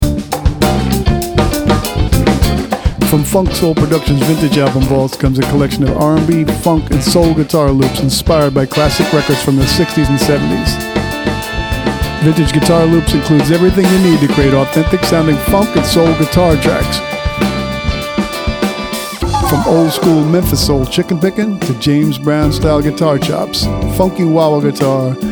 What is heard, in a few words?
vintage guitar loops